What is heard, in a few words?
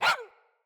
Puppy Idle Bark, Vocals; Kubrow